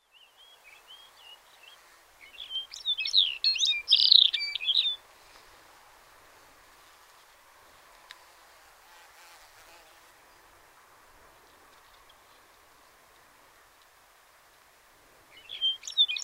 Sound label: lapland bunting